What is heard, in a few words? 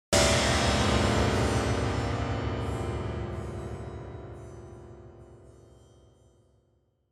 Crash on piano strings